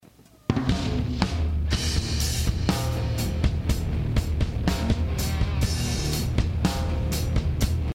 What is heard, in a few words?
alert; stark; ggf new follow